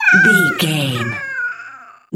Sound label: goblin fairy death